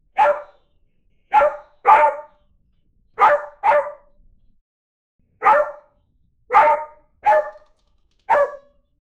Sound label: dog barking juicy